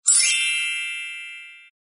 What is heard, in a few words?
orchestra bells glis up